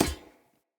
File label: Unarmed Fey Parry Impact; Weapon Impacts; Player; Combat